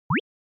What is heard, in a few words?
Anime Motion Low